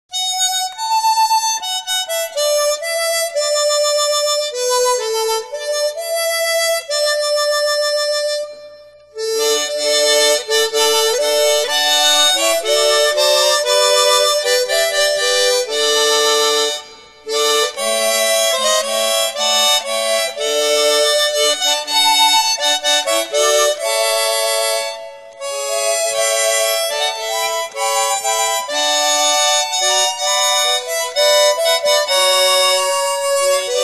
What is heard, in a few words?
Favorite Hymns On Chromatic Harmonica; I Love To Tell The Story